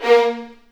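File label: A#3, The Ultimate String Collection, violins marcato; East West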